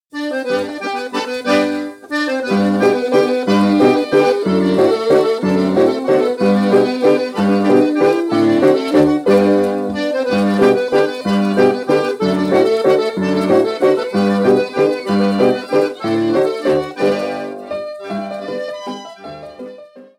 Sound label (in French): Trommelvalsen, Danemark